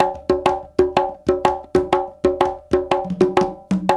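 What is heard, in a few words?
Bembe Latin 6 8; Spectrasonics - Supreme Beats - World Dance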